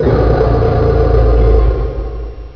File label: attack, monsters lurker; aomclassic; sven